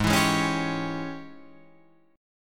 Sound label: G sharp Major 7th Suspended 4th Sharp 5th, Guitar, Open F; 8,11,8,8,10,8; JGuitar